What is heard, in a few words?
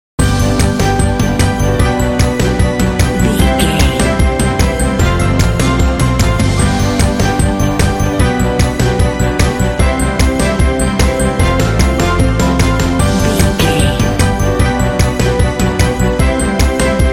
pop inspirations